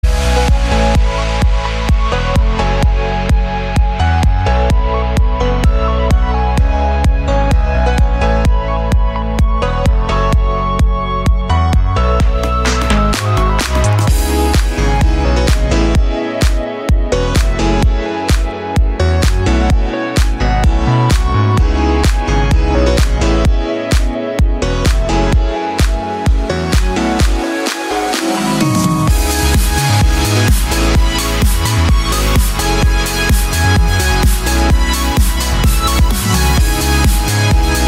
sweet electro

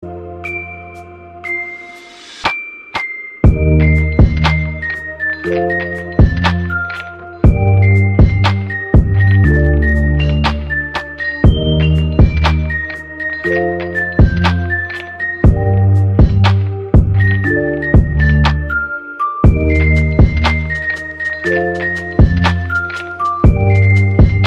tokyo ghoul lofi

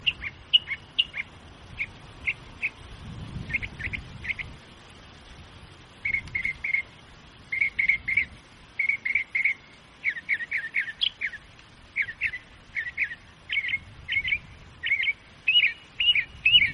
bird sound

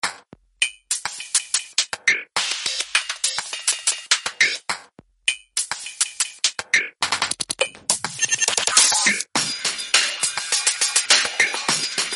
glitch beat

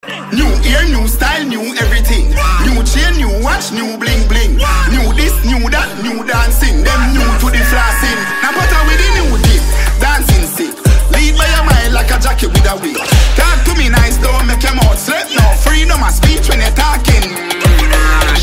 Ding Dong

